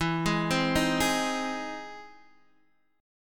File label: E Augmented Major 7th E down Guitar Open D